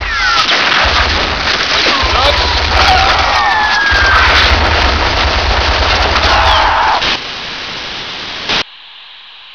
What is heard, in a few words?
radio battle